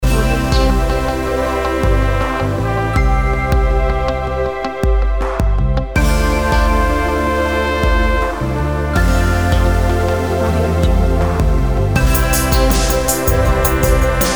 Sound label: quiet trip logo